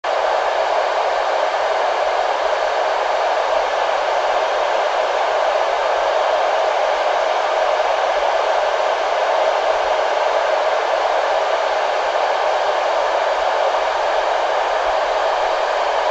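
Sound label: Static Mobile VHF Radio, static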